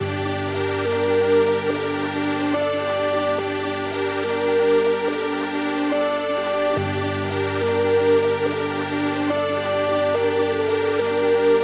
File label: 142 BPM G Min, Melody, Drill; Cymatics; 2022 Melody Collection